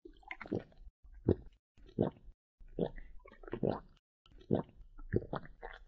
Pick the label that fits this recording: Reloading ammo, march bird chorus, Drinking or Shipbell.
Drinking